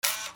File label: CAMERAS